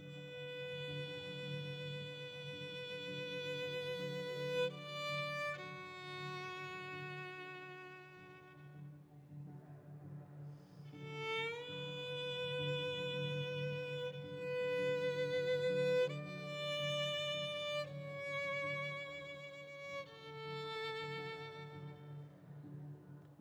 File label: vln